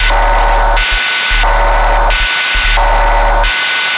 Alert

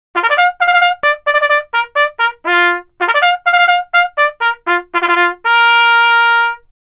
bugle first call

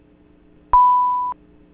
High Chime

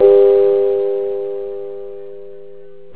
Pleasant Tone

